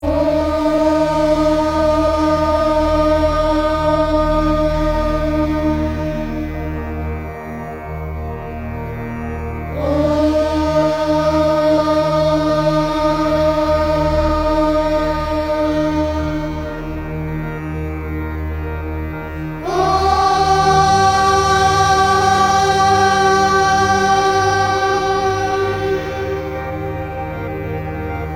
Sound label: Chanting Of Aum, ringtone; Shiv Ji